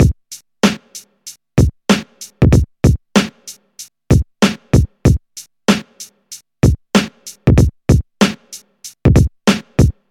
95 bpm drum beat c sharp key, kick tuned to the C# note